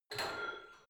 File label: barbell heavy plates lift rack reverby; sport freizeit; fitnessstudio